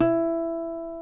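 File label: D3 HARMONIC, CLASSICAL GUITAR; Northstar - New Gold VOL-2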